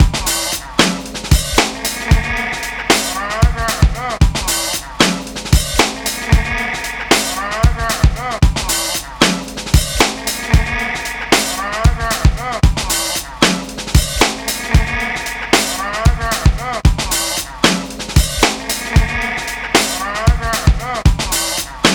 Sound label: 36 FLUNKY DRUMMER 114 bpm, Loops Breaks; POTBreaks